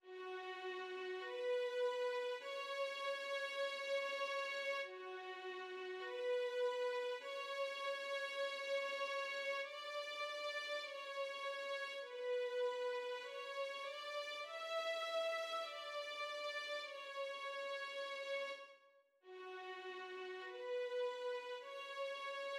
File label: Aases Death orch